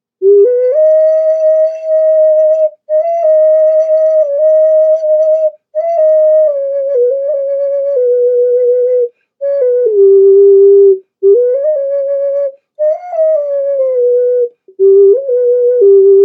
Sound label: Dinosaur Turtle Ocarina Ancient Flutes; Dinosaur Turtle Ocarina musical instrument; First Nations Music